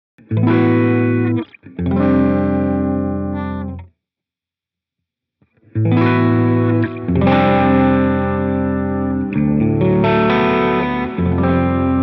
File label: Ambient